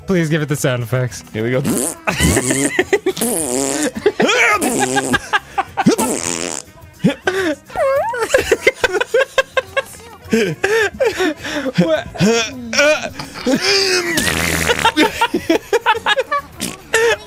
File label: Farting Consectively